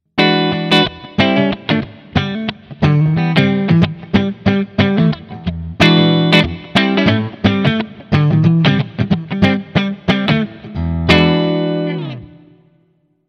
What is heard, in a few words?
Epiphone Les Paul 60s Both PUs Clean